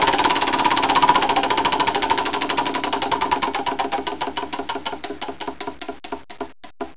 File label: wheel; cassino; regal; casino